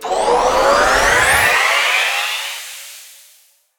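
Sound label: cash near, slots; pork knox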